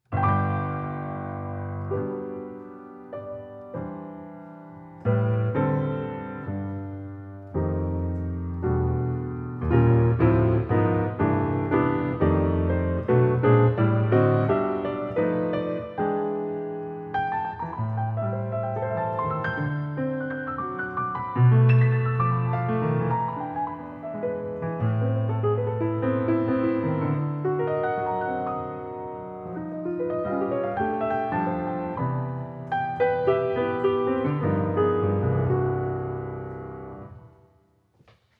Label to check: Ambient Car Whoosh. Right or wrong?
Wrong